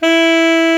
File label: ALTO SAX E4; Greytsounds VOL-1